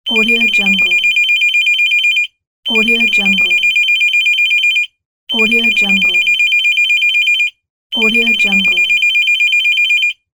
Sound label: telephone ring